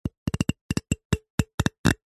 comb metal fingers run through teeth; zapsplat

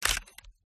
WEAPONS FOLEY RELOAD PICKUP HANDLING

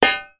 impact metal heavy thump clunk

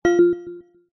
game sound soft warm digital tone positive select

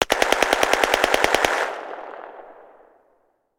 gun carl gustav m45 swedish k; still north media

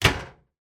household dryer metal door close; sound effects one

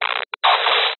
science fiction radio signal interference burst

